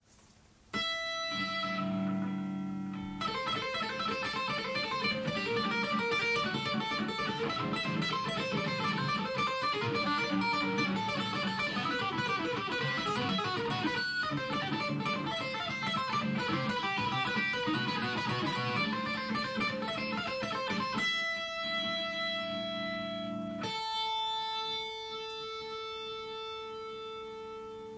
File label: Tapping